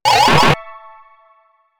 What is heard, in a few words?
Sting SUCCESS; Osteotic Bypass